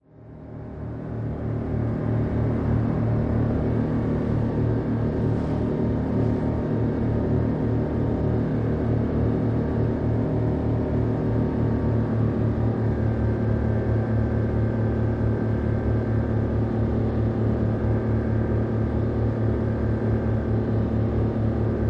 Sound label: Military Pbr: Onboard Medium Speed Cruise. Some Water Movement. Dual